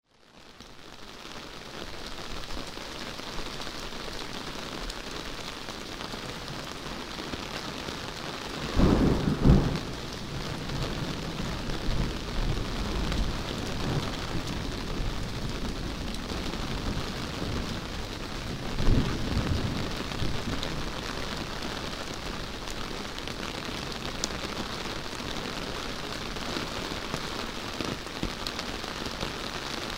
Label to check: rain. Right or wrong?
Right